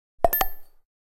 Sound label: Email, Nokia Alerts; Windows Phone apps